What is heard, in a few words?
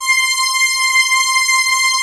Prophet Five